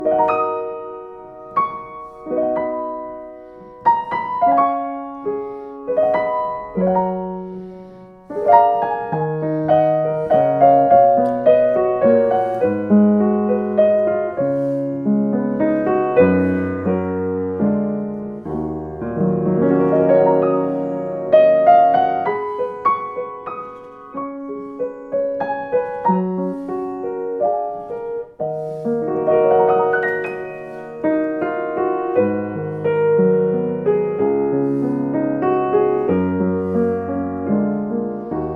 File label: Yamaha U3HS, piano